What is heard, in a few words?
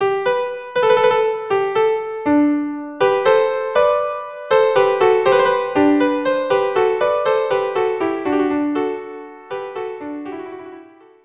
Loure viola duo